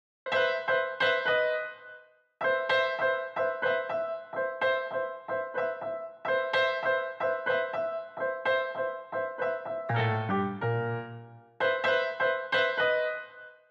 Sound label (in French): piano qui pleure